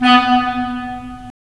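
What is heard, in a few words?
wind clarinet; Roland - LA Composer vol. 3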